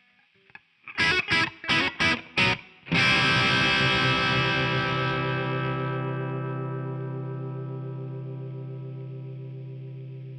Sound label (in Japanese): ストラト歪